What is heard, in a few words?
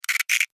Insect Voice Click, Insect, Voice, Clicks, Vocalization, Bug